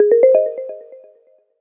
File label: Climb; amazon fire hd 8